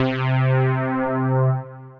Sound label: Pad; Poly Magnet Pad; Ableton; Singularities